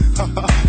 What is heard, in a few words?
haha